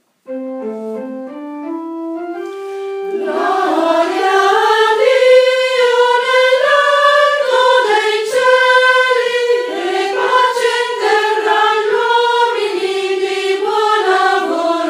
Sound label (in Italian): Donne; Gloria; Prima Parte